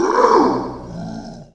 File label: death; Alien Arena; xenoid